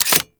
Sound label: Uzi Pistol Mech; Warframe; Grineer